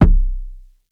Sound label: big room kick single shot c key